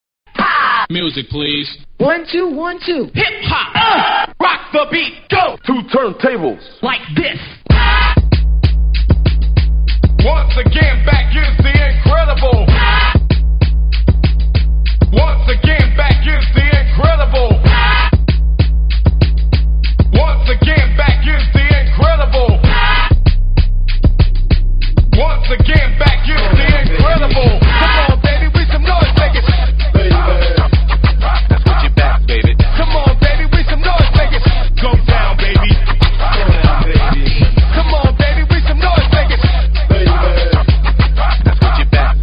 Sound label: funk; multitrax